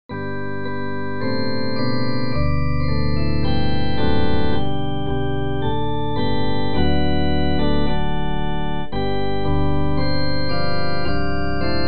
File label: instrumental hymns; joyful joyful we adore thee